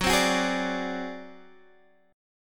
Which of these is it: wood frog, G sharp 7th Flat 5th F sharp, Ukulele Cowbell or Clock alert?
G sharp 7th Flat 5th F sharp